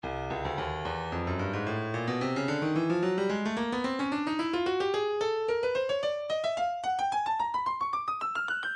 breakbeat 110 bpm; booganu b; Stylus Breakbeat Midi Files

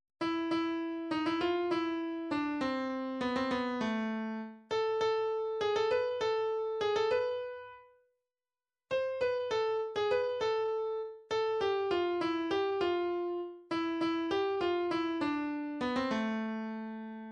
russian, midi

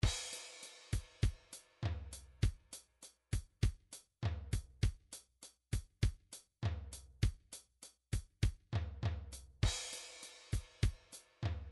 midi drums, sixties

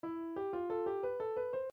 melody, midi

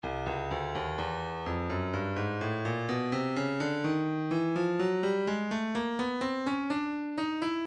breakbeat 063 bpm; Stylus Breakbeat Midi Files; 060 064 Bpm; Seep B

